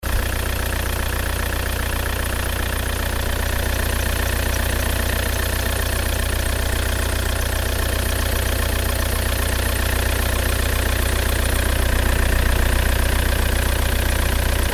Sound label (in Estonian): TiD klõbin mootoris